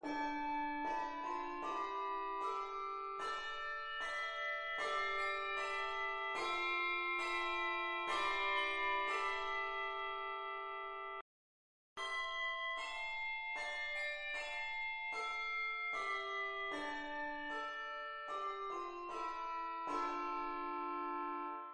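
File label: handbell reading; Psalm 51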